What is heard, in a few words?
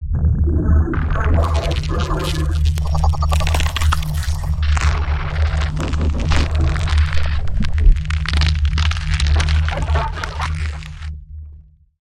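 alien life form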